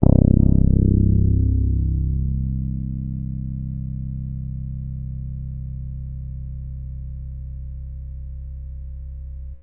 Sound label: Access Virus B; Synthworx